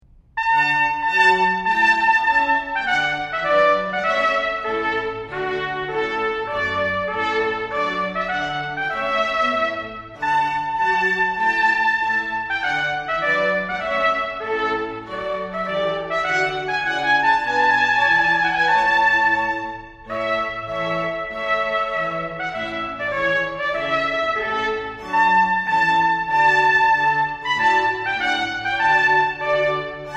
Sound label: Trumpet Tune; rivers bend chamber samples